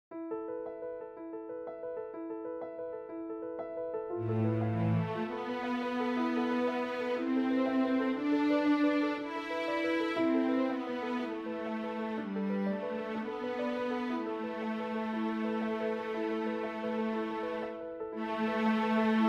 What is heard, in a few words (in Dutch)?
Pianotrio met fluit en cello